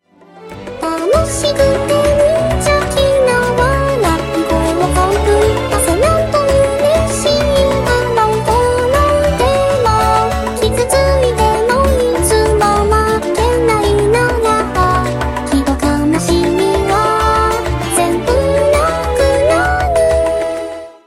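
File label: background music crossfade; Invaxion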